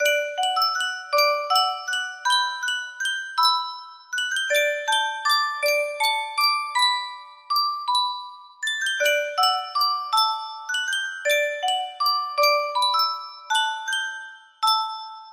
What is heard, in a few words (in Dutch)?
Yunsheng muziekdoosje